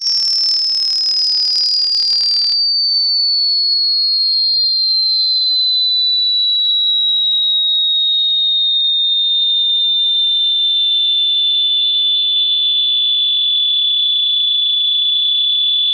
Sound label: Most Annoying Ringtone Ever